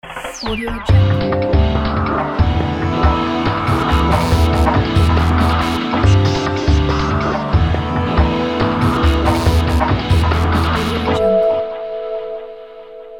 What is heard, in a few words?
podcast opener